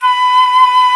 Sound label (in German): FLOTE, Alpine Volksmusik; Best Service